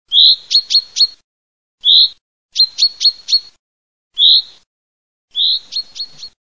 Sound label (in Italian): fringuello